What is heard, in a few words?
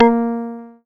PLUCKED, Synclav FM, A#; Big Fish Audio